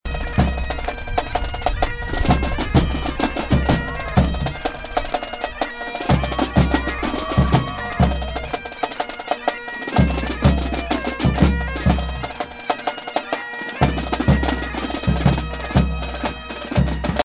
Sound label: Pipe Tune; greasepole; More Queen's Bands